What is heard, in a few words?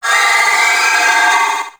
Attack Long, Vocals; Warframe; Sentients; Sentient Trooper